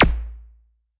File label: enemy shooting; Alpha-E-Corp